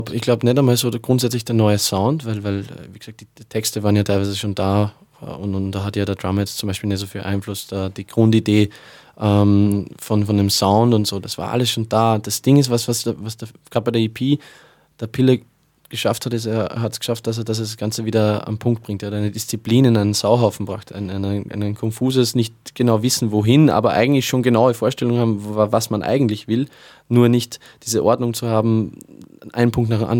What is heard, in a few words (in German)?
Drummerwechsel neuer Sound